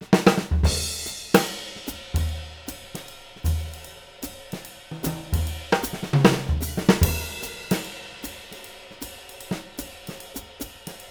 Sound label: SWING, Living Drums